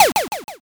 shot

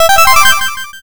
oneup

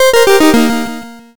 gameover

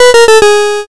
lose

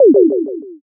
poyon

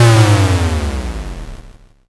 explosion l

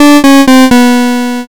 lose

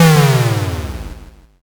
explosion l

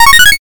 oneup

